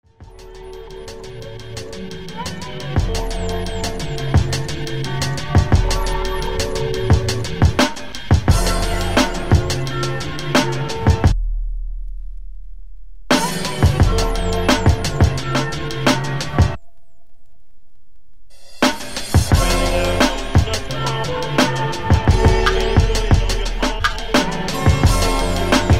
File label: instrumental